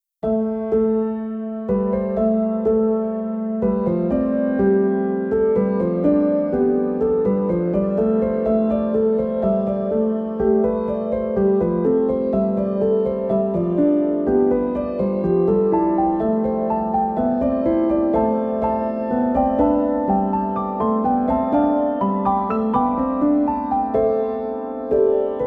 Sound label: Grasses, PIANO